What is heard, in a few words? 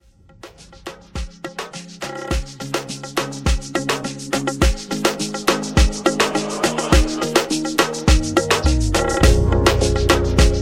Re Drum 104; Full Blown